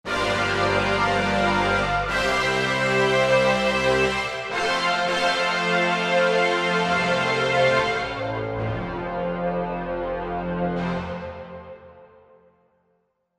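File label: Korg MSC-1S Stereo Bra2; Stero Bra2; Korg MSC-1S Variety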